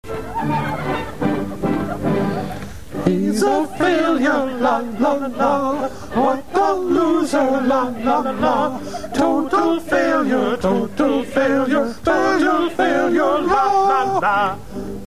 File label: failure song; MST3K; MST Earth Froze